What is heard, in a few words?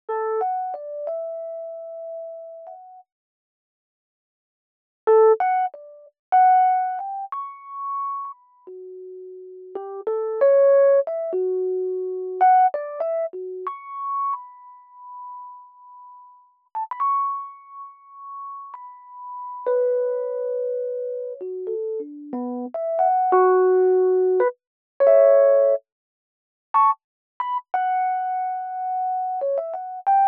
Bm90 Piano Impro dry